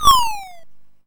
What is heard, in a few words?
YM Fly By; SFX; ChipShop Deluxe; ChipShop Hits